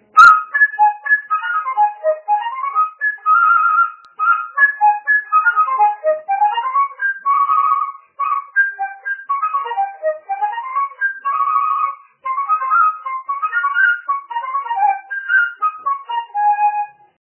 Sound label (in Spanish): flauta